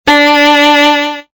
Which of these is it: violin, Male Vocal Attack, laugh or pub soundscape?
violin